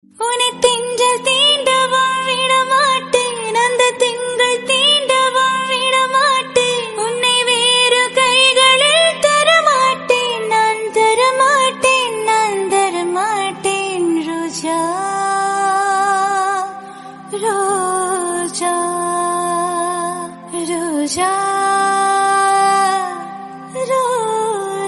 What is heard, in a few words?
Female Version Tamil Song Ringtone